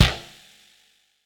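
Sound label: FX bass drum, Drums Acoustic; FX KIT; USB Soundscan vol.10; AKAI; 90s sample CD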